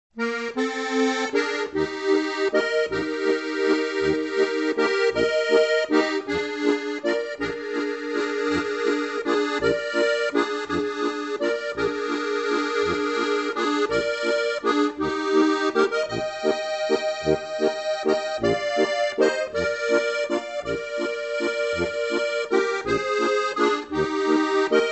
walzer

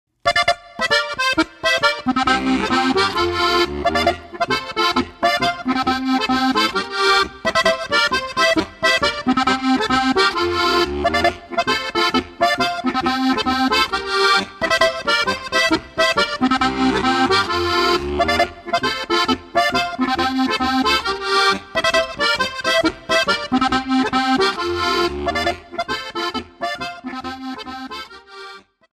polka

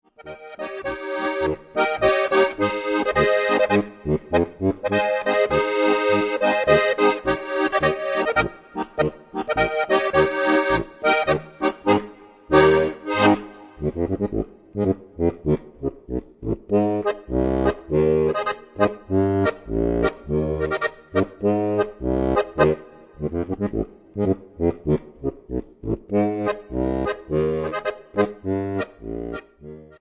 marsch

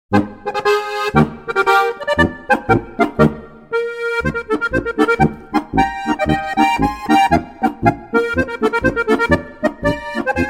marsch

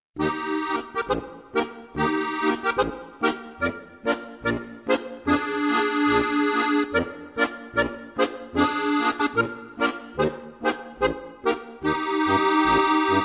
boarischer

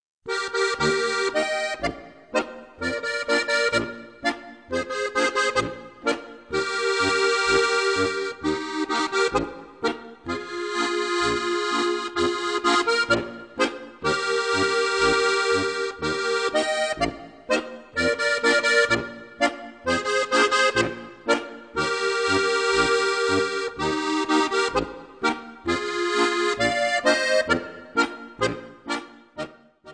boarischer

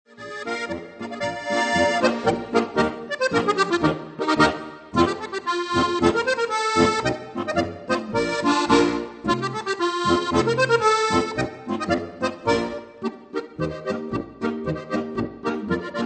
polka; Harmonikastückl aus Bayern

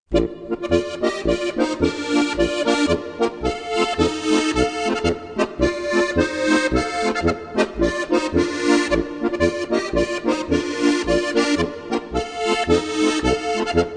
polka

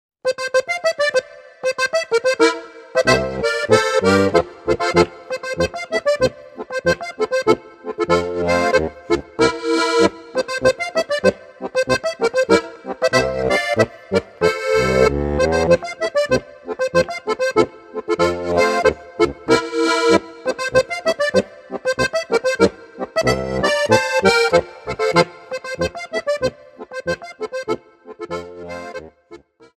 boarischer

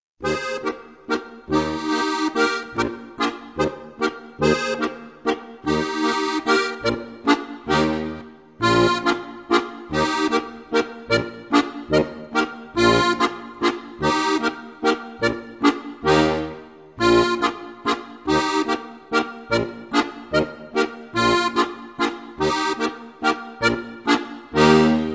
zwiefacher; Spielstücke für Steirische Harmonika, Band 1

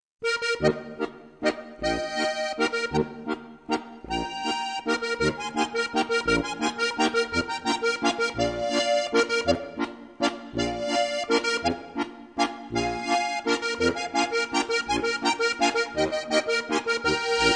walzer

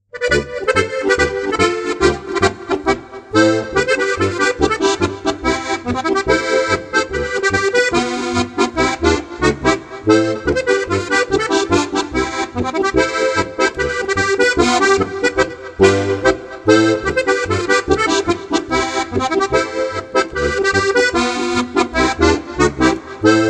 Harmonika Polka

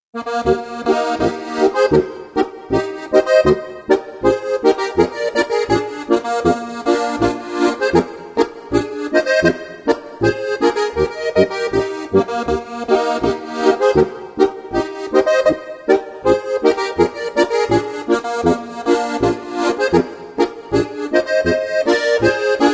boarischer; 30 Volkstänze aus dem Alpenland

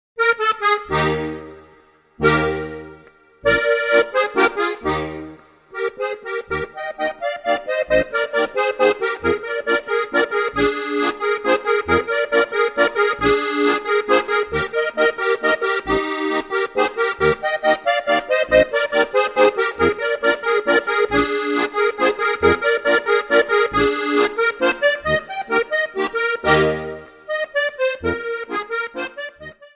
plattler

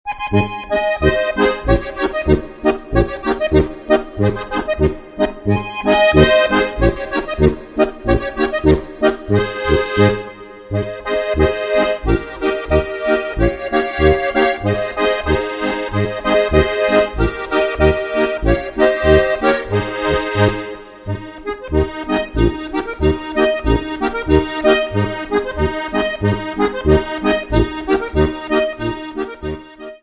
gstanzlform aus dem salzkammergut steirische harmonika; 1000 beliebte Gstanzln

